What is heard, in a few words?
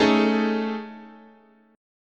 A Major 7th Flat 5th, chord, guitar